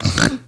Snorts, Daughter, Vocalizations; Warframe; Deimos

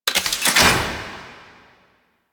Ocean Injection Shackle Unlock; Ambience; Warframe; Grineer Ocean; Ocean Sabotage Injection

